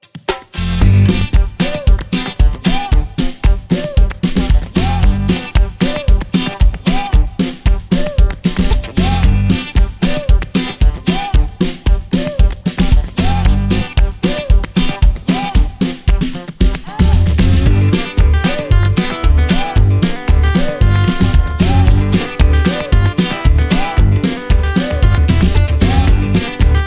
114 BPM Country; Yum Yum Cookstar

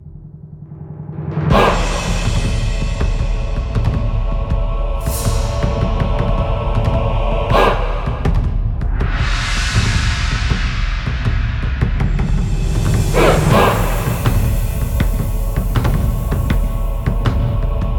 TENSION LOOPABLE

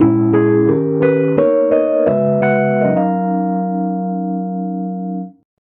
Startup; Apple Climbers

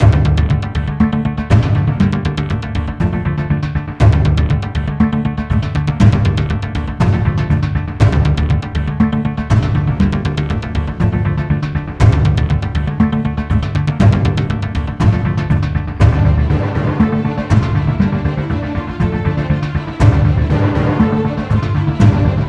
Music Credits

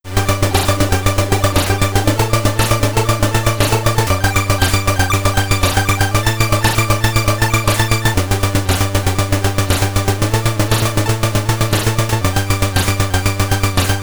Get Ready!, SID Stereo, commodore 64; Speedball

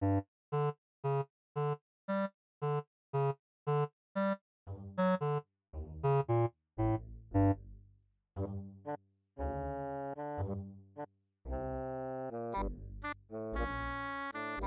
MIDI BGM Europe

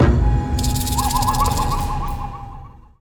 Percussion stinger

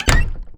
Doors Front Clos; Diner; Light Buford; Fx Doors Front Close

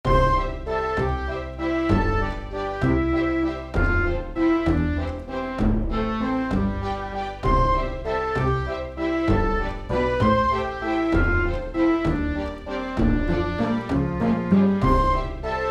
Tavern; Sun Haven

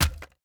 wood hit; teardown; ps5 windows xbox series xs

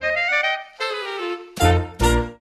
End Lick; max ruby toy parade online gamerip 2007

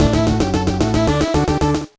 (Jingle) Race Victory; rayman raving rabbids gba gamerip 2006

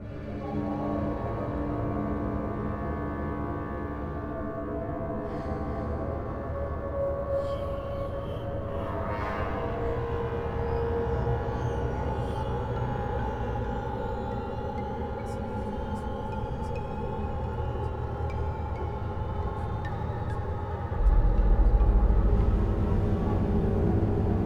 Level Cemetary; Dungeon Hunter; android game music

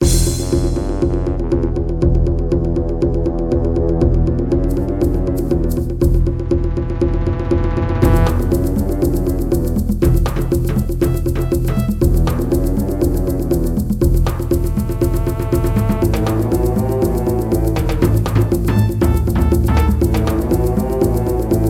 Conga Battle

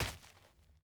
dirt land

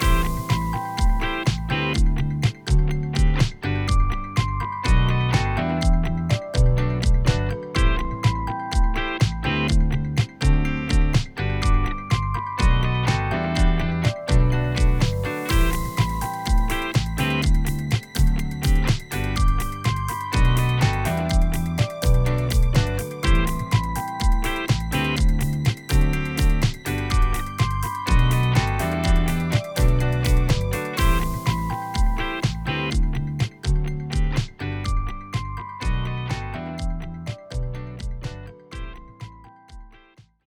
K Pop; Sun Haven

